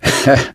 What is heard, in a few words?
laughter, gambler, survivor voice